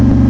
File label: ENGINE; Carmageddon Demo